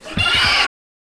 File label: Mob die; Monster; 원숭이 부족; 작은 금엽후